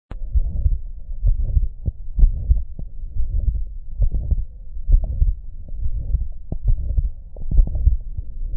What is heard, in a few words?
Pulmonary stenosis; simulatorvzorky